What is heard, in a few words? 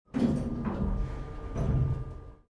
Field Object Elevator Up; starbites